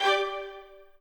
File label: strings